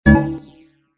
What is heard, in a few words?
Denied error beep warning ui